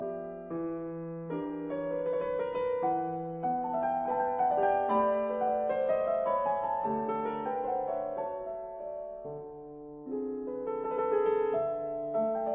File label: Mazurkas in C major Allegretto; 9 Mazurkas Op 25 No 2